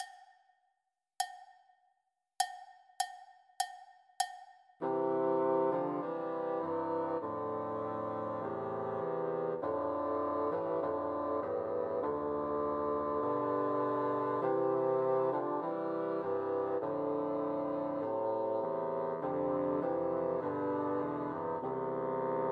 In the Bleak Midwinter Christmas Trios for Three Bassoons